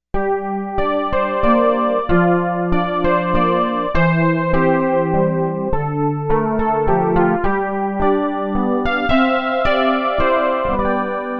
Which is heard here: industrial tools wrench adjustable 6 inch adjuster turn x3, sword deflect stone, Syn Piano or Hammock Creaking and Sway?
Syn Piano